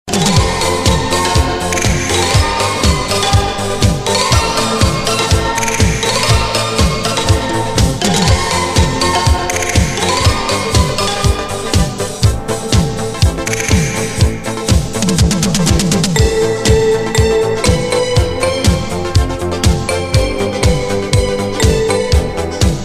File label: Instrumental